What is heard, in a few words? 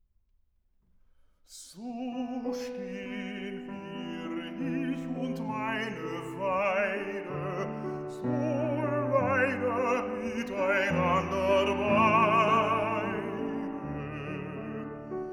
Songs for voice & piano; So stehn wir, ich und meine Weide; Brahms, Liszt Lieder